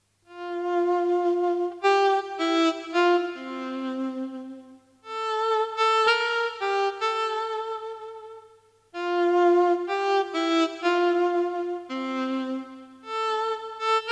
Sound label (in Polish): sax alt; Koledy; Wsrod nocnej ciszy